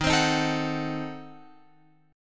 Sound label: Eb Diminished 7th Eb down Guitar Open F; Ebdim7 strummed; JGuitar